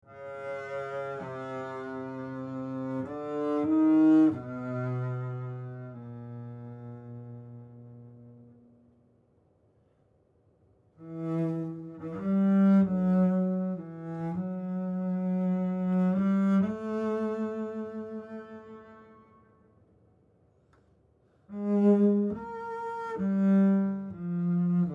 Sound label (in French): Contrebasse comtemporaine; louis-antoine lassonde